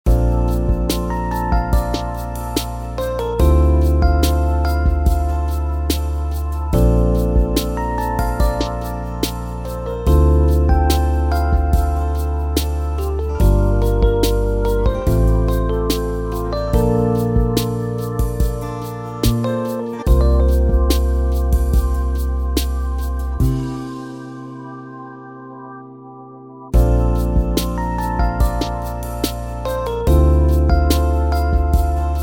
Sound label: music sample background melody